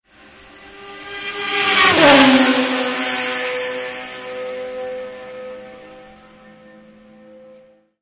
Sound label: Racecar Formula1 By, Formula one racecar by; Race Cars; Vehicles